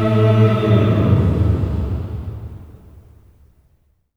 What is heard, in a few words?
OCT FALL, voices; OCT.FALLS